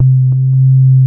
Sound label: FILTER RINGS, TECHNO; Pro-Rec - Synthsonic; Z1 TECHNO